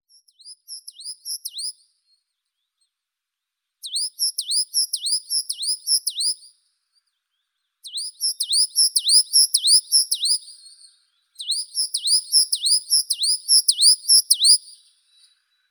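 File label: azure tit Cyanistes cyanus, nature sounds; lazorevka belaya; knyazek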